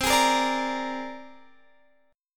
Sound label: C 7th Suspended 2nd Sharp 5th, C, down, Guitar, Drop D